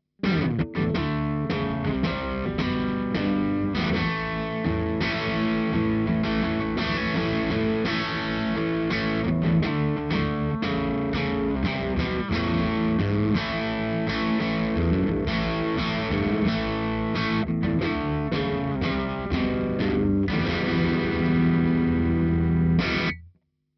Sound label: GTR Sweet Honey Rock, On electric guitar, overdriven